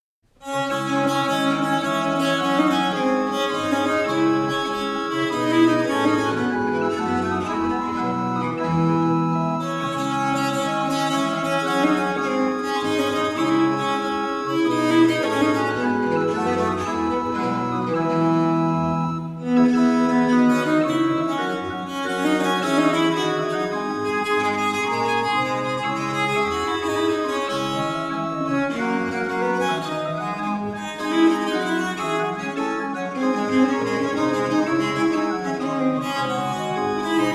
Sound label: Gigue Partie a Viola da Gamba solo com Cembalo D Minor; Theodor Schwartzkopff; Ernst Stolz; German Viola da Gamba Sonatas